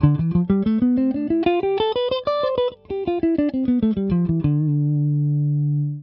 Re Dorien, Guitare; Mode Dorien à partir de Ré : Tonique à la 10ème case; Ré Mi Fa Sol La Si Do

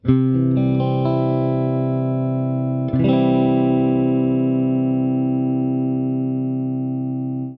Do majeur, Guitare Accords ouverts

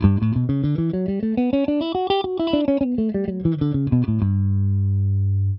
Sol Lydien, Guitare

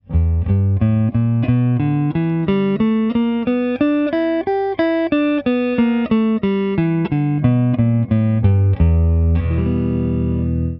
Penta b5 1ere position, Guitare, Penta Bluesy; 1ère position pentatonique mineure + b5; La pentatonique bluesy

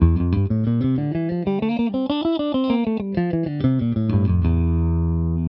Mi Locrien, Guitare; T b2 b3 P4 b5 b6 b7; Accords compatibles : Diminué ou Demi-diminué

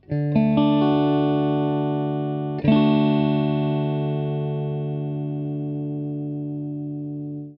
Re majeur, Guitare, Accords ouverts

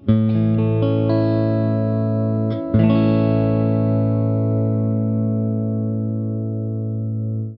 La majeur, Guitare Accords ouverts

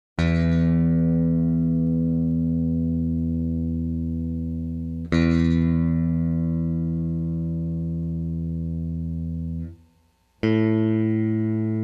Accordage guitare; Les notes des cordes à la guitare en accordage standard.; Guitare 6 Cordes; Mi La Ré Sol Si Mi

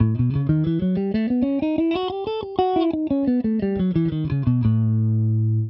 La Lydien b7, Guitare; Exemple audio " La Lydien b7 "; Mineur Mélodique

